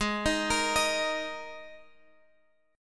G sharp Suspended 2nd, G sharp, down, Guitar, Open F; arpeggiated